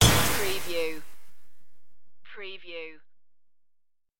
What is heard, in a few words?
dirty futuristic impact